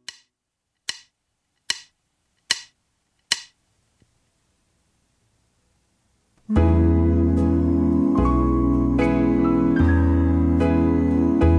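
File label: Karaoke Backing Track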